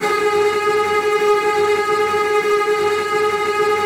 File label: Virtuoso Strings, TRM FX